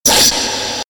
short movement sound

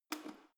letterbox metal turning key

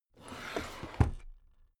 drawer cabinet stuff close regular

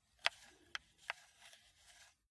console controller joystick move sequence

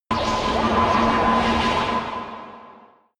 space machine alien construction

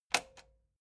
metal button spring click slow

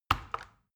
plastic button tiny click heavy slow

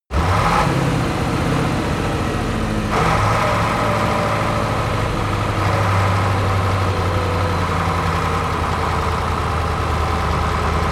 truck ural 4320 deceleration acceleration

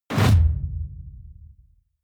sci-fi underwater torpedo fire

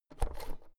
oven knob press in hard

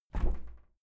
window plastic squeak open heavy

